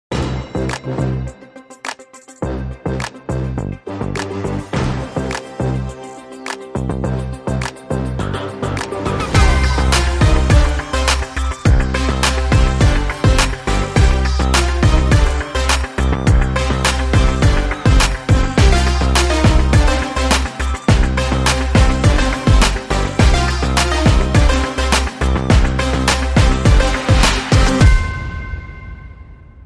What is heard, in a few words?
Beefy Brass